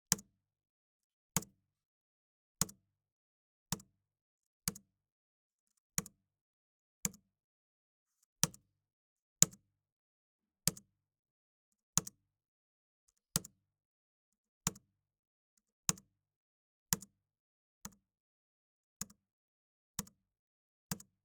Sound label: Enter Key Small Keyboard